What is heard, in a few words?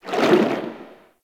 Ocean Shark Tail Move Swim; Warframe; Grineer